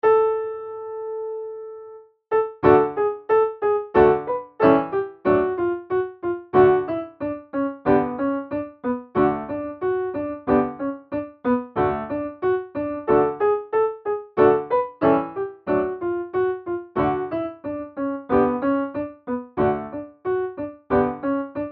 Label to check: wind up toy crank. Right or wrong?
Wrong